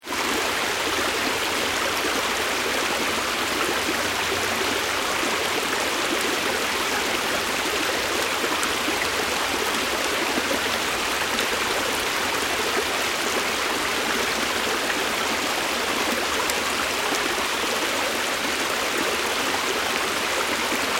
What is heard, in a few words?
Stream Wide and Fast; Rivers; Nature